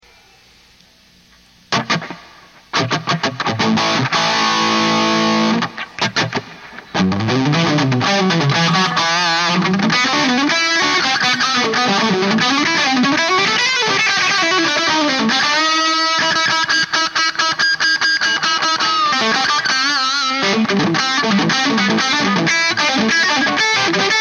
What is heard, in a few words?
Plexi Noodle